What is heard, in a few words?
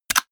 click